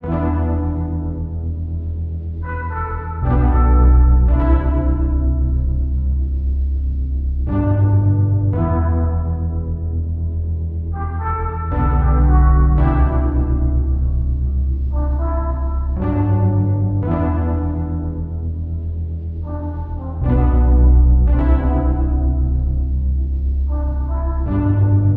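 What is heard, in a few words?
Melody 113bpm G minor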